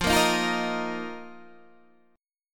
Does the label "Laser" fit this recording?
No